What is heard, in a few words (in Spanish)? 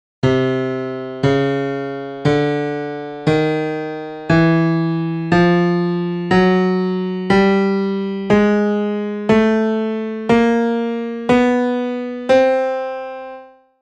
Escala cromatica